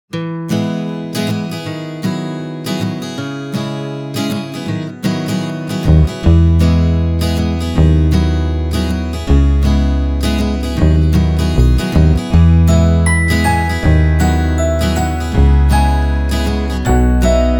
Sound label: ACOUSTIC FOLK Daydreaming Folk